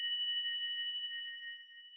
sonar Tail Water Far Beacon; Barotrauma; Real Sonar

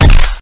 impact; Thalassophobia Restored

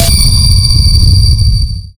sonar Tail Water Close; Barotrauma; Real Sonar

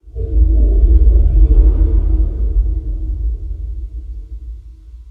Ambient; Barotrauma; Room FX

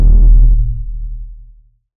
sonar Tail Suit Very Close Beacon; Barotrauma; Real Sonar

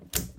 switch; Barotrauma; EK modern

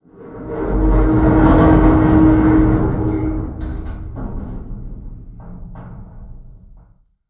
Creak; Barotrauma; Room FX

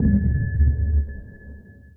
sonar Tail Suit Medium Shuttle Directional; Barotrauma; Real Sonar

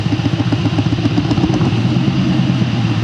vacuum pump small; Electricity; EK modern

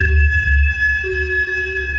sonar Ping Water Far Shuttle Directional; Barotrauma; Real Sonar

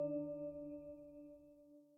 sonar Tail Air Close Directional; Barotrauma; Real Sonar

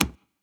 UI switch; The Avali's Audio Overhaul

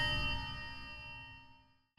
sonar Tail Water Medium Beacon Directional; Barotrauma; Real Sonar

